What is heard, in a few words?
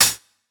Hihat Closed Shuffle